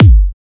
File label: drum kick